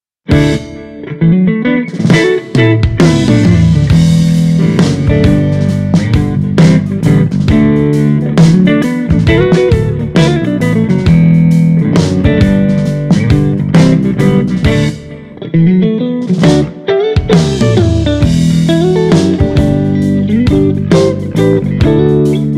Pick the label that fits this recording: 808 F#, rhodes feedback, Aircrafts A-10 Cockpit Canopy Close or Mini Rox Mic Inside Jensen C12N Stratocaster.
Mini Rox Mic Inside Jensen C12N Stratocaster